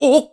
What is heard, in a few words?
Slide; Link; zelda sounds; Skyward Sword